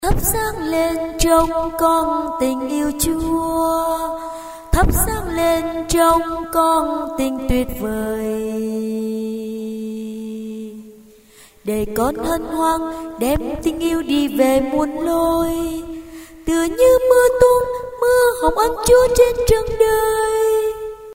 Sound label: sop alto